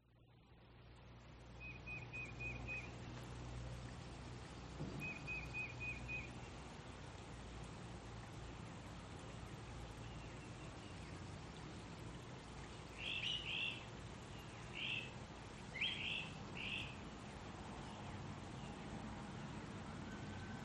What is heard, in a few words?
soundscape sunrise campsite lying in tent near stream; omar alvarado